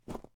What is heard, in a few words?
Snow